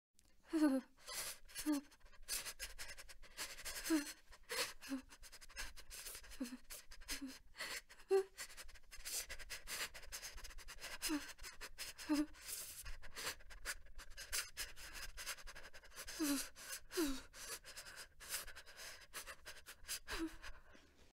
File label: Shivering from cold; لرزیدن انسان از سرما